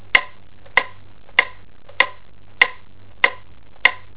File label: metro; kids